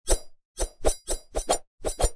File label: cappuchino assassino attack